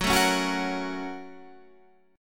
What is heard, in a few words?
Bb Minor Major 7th Sharp 5th